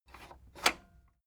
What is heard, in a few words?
cassette eject click slow